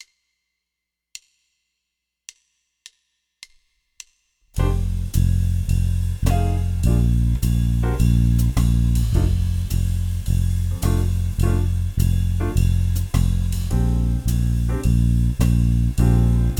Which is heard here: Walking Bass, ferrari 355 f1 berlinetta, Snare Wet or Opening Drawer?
Walking Bass